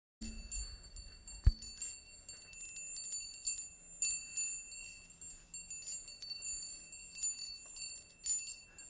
weihnachtliches windspiel

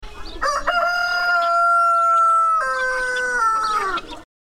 Hahnenschrei

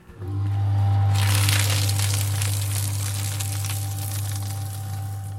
fleischwolf

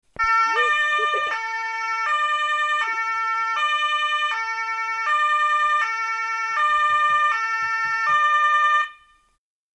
sirene

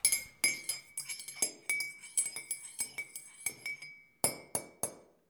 Gläser Klirren

